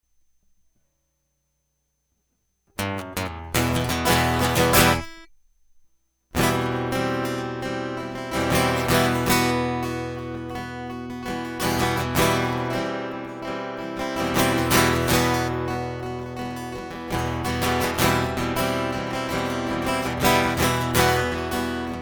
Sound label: Just Is Guitar Loop